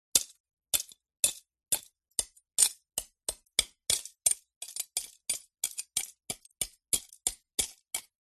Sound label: ice block chip away with small hammer or knife; zapsplat